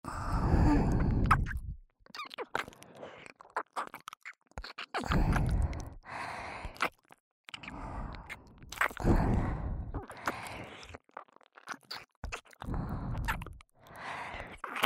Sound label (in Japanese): 両耳キス; おまけループ（オナサポ）; 耳元プレイ